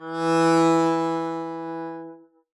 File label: arco e3 f down, double bass; dsmolken